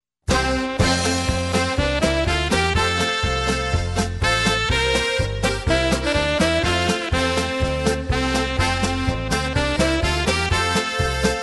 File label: Polka